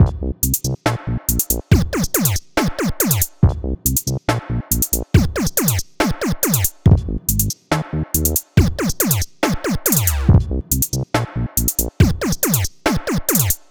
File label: New Drums